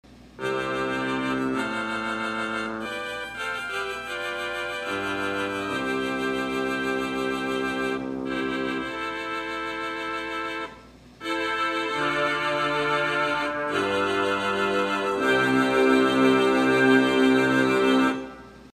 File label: Salicional, theatre organ